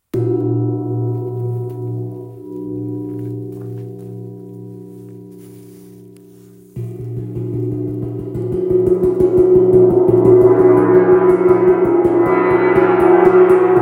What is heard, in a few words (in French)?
Chau gong 60cm si